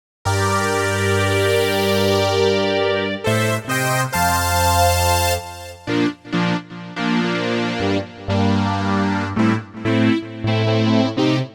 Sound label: pad universal pad sound